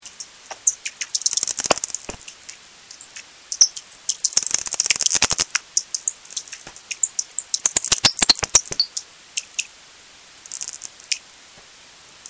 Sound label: bat audio